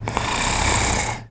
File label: Snore; Salvatore snoring; zelda sounds